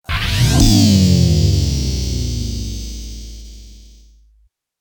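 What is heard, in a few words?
Approach Distorted Bass Drop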